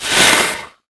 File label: death; spider